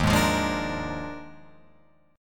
D sharp Major 7th Suspended 2nd Suspended 4th, Guitar, Open F; D#M7sus2sus4; strummed